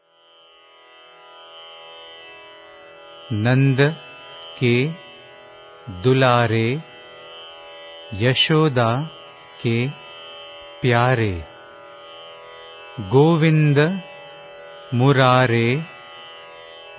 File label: bhajan; BHAJAN TUTOR; NAND KE DULARE